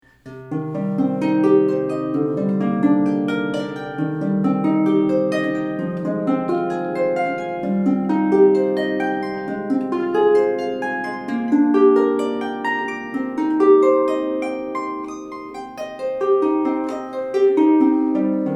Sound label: Mia, harp; start play series